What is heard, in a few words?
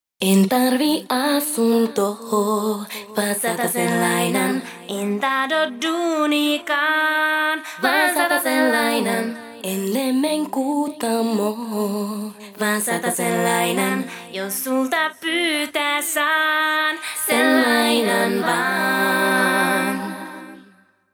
Satasen laina chorus a cappella